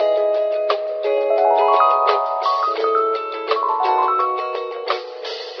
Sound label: keys